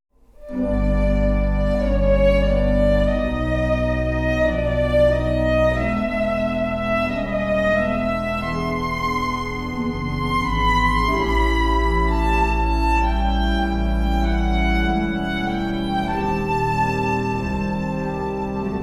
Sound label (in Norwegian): fiolin